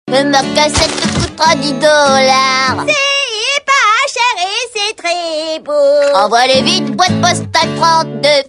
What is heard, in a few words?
jingle